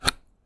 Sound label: unplug USB